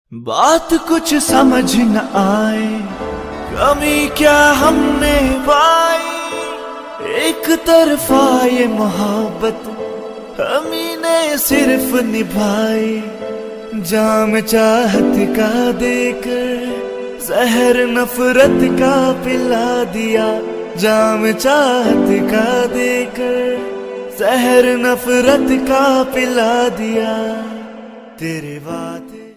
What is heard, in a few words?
Sad Hindi Song